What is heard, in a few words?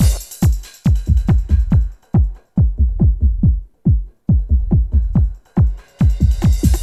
techno 140